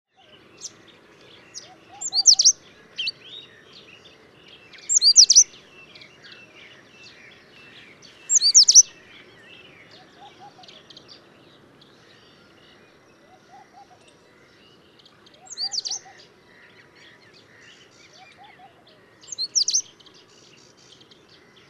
Indian Robin Copsychus fulicatus

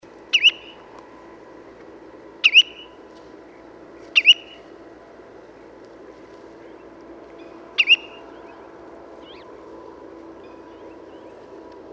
Spotted Redshank Tringa erythropus

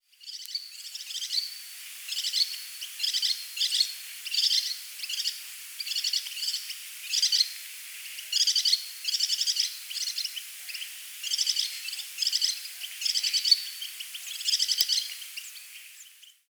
Black chinned Yuhina Yuhina nigrimenta

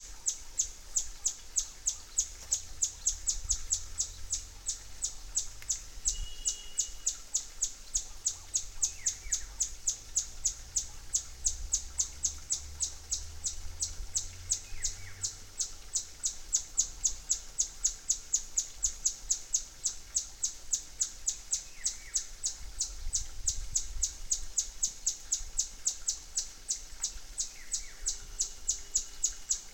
Scaly breasted wren babbler Cupwing

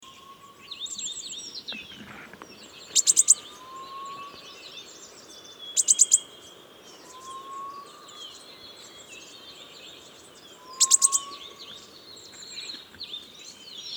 Mrs. Goulds Sunbird Aethopyga gouldiae

